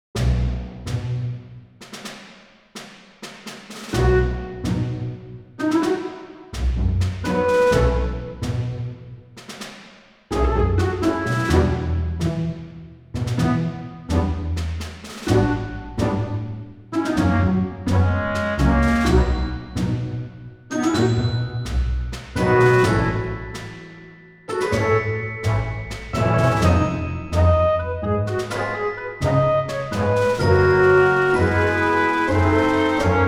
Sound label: BGM Adv Generic